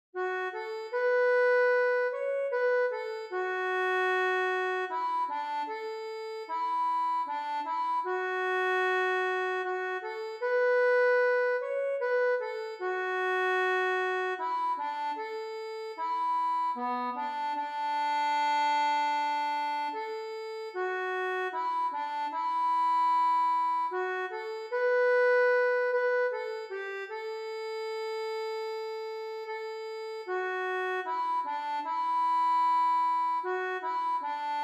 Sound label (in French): ACCORDEON; DEMEUREZ EN MON AMOUR